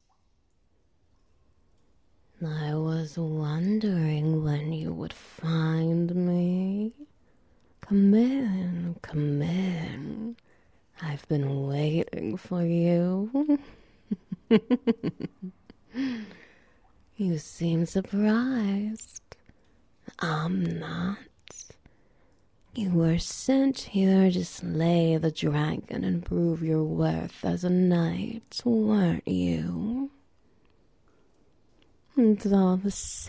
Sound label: Ambience Wet Noises; Newbie Adventurer